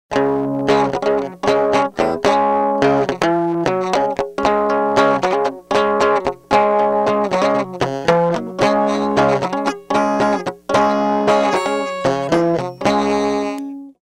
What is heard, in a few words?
rhythm